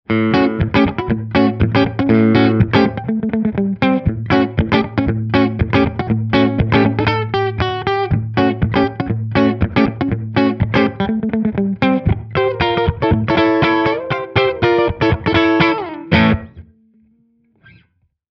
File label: Neck Bridge clean; Fame Forum IV IF